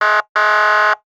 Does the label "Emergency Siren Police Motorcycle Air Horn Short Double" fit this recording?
Yes